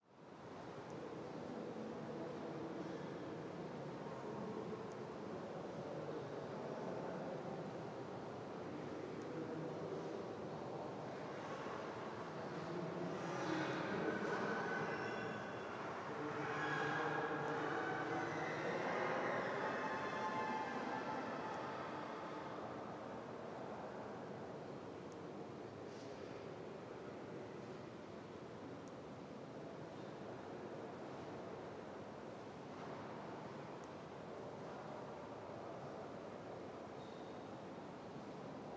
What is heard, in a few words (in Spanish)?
Catedral de Oviedo; OscarDeAvila